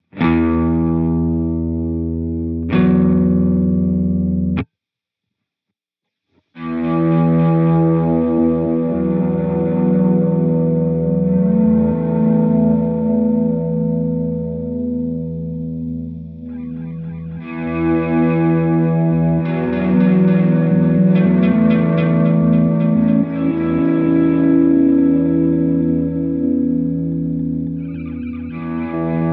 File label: Ambient Triggered Multi Swell